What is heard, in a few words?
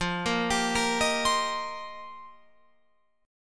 F 7th Suspended 2nd Suspended 4th F Guitar Open F; F7sus2sus4; arpeggiated; 17,17,19,17,18,19